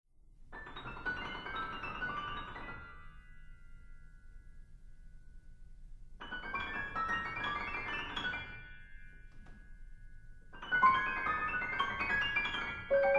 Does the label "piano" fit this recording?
Yes